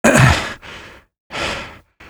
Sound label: fish hook grunt, fisherman; lostcoast